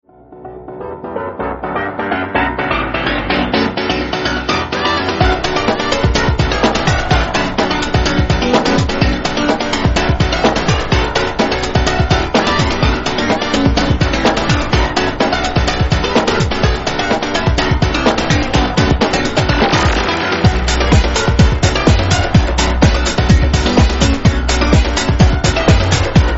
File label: evil piano